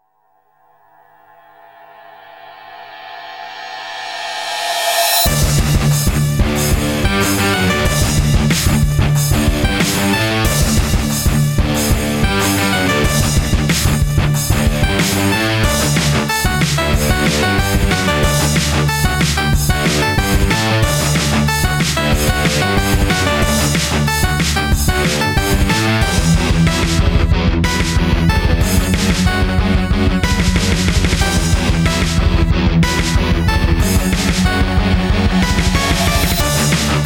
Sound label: breakdown punk popcore